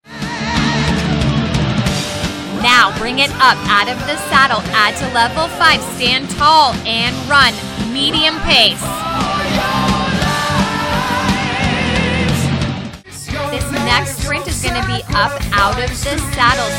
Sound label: Rock Class Sample